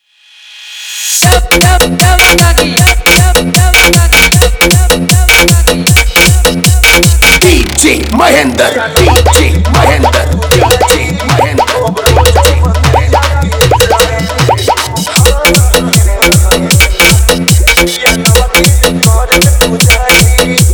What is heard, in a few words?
Navratri Remix